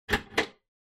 Pendant Light Pull

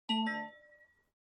OS Sound (Speaker-Notification)

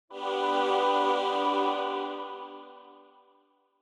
Angel Low

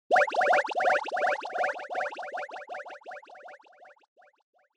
Fantasize Long; 妄想04-2(長); シーン・妄想

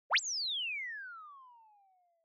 Motion Slide High

